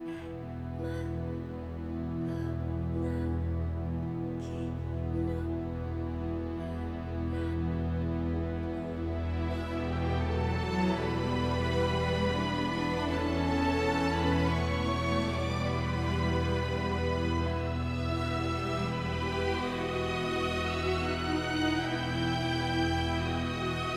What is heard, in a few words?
Cave Mural (Big Strings)